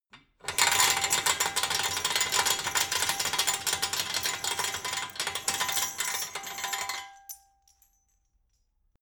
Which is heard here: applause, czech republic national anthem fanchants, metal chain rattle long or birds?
metal chain rattle long